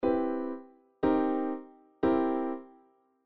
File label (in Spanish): Acordes con 7ma